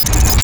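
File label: Global Main Menu Forward; ogxbox; XDK4627 BIOS and XDK Launcher and Dashboard(for Retail)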